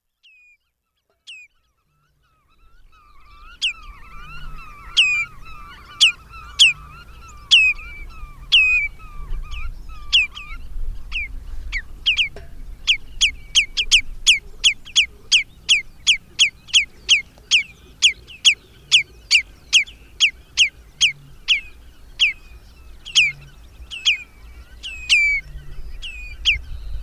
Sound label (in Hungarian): Piroslábú cankó; Madardalok